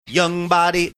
singing

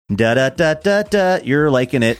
singing jingle saying you're likin it; mcdonalds

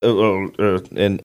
stammer gurgle

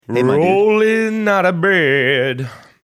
singing rollin out of bed

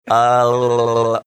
making funny mouth tongue sounds

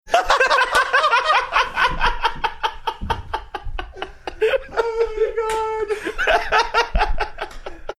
having good laugh

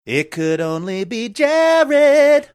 singing it could only be

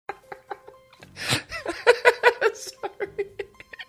laughing saying sorry

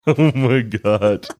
laughing saying oh my god

